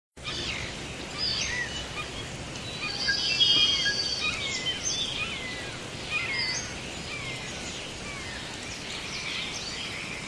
Sonido 3D Lluvia tropical rainforest rain 3d sound; 15 minutos